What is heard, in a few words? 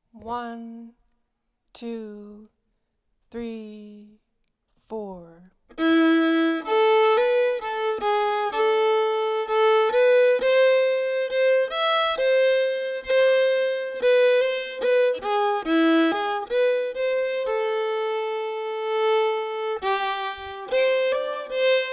andakten slow, fiddle